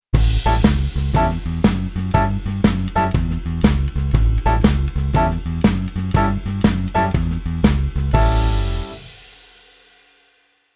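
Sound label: keyboard piano blues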